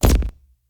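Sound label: explosion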